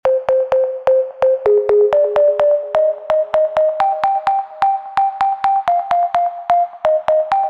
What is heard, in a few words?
Plucks edm Vital